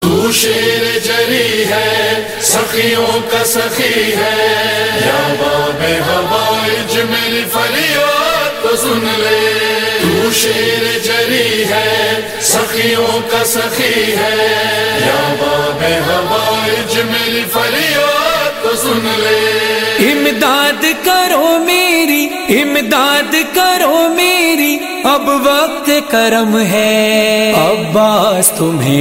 noha tune ringtone

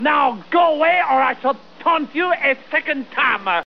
french taunt cool ringtone

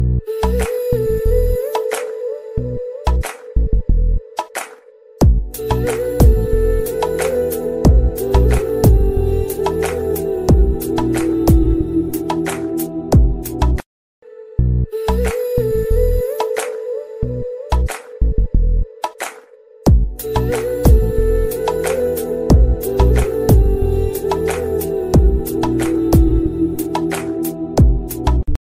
romantic bollywood ringtone music

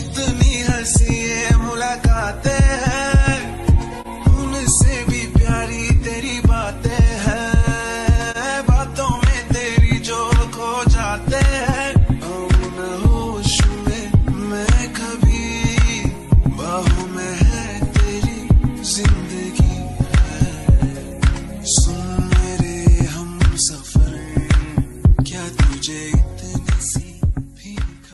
hindi music ringtone, bollywood; bollywood ringtones

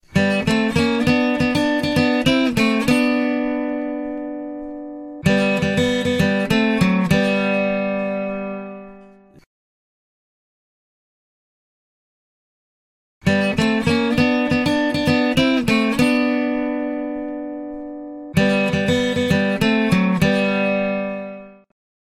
best sms htc ringtone

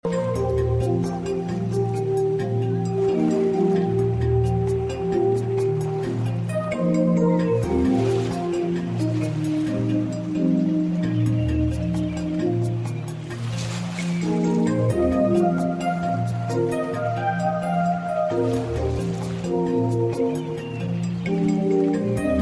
forest morning refreshing music tone

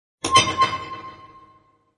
tick tick soft music message tone; sms ringtones

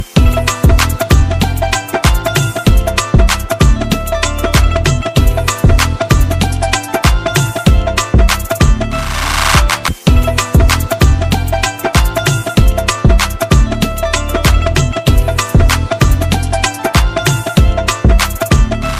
instrumental ringtone, bollywood

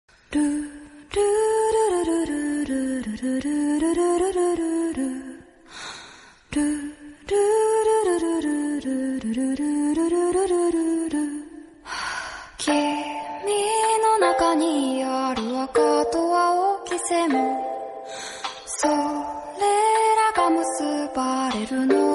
lovely humming sound tone; tu toro ro

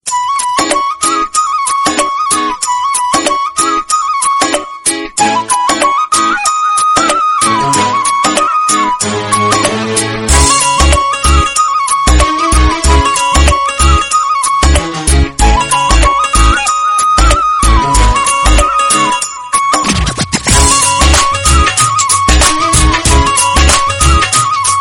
cool hip hop background music tone ringtone

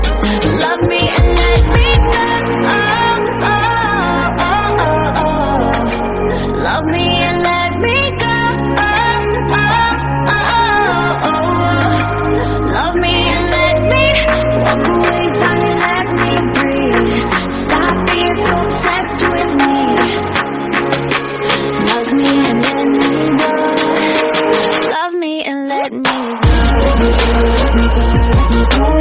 female romantic song tone ringtone

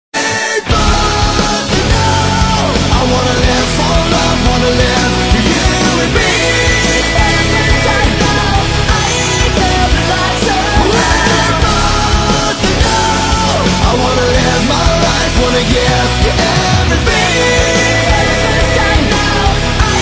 htc rebirthing ringtone

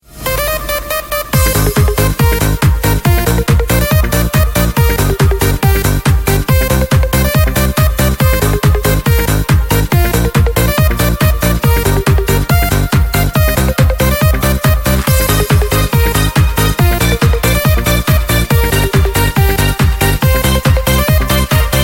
house party ringtone

